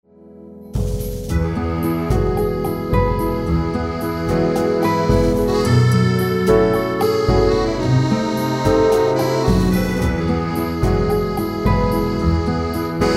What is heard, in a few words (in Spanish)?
MILONGA; Suite Coll. Vol.15